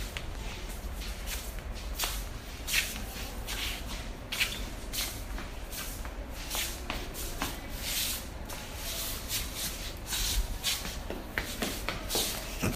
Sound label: Feet dragging